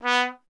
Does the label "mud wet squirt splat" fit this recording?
No